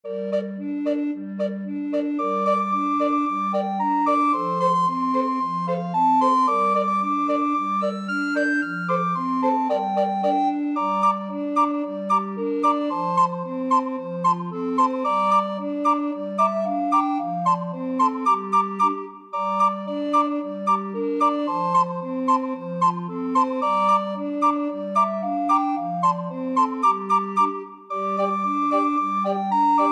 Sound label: drunken sailor, recorder quartet